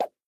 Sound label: close menu; Skillzgaming; battle Mania Desktop